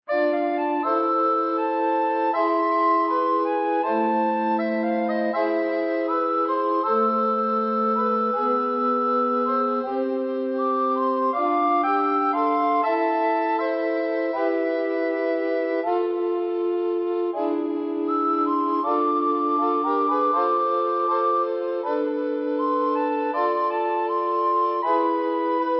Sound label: SATB Recorder Quartet